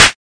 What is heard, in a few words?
melbourne bounce Clap; STYLE KITS (Hip Hop, Etc); D3ron Melbourne Bounce Kit